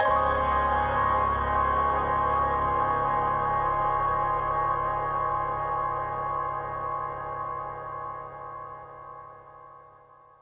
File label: dirty piano, Synths; Notes